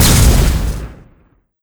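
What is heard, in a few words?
boss explosion, monster; Bizen; steamer crazy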